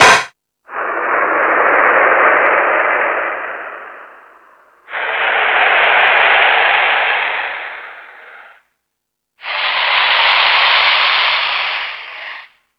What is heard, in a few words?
Found Sound, Drum Machines